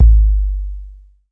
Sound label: fat 808 sub, Jungle Bass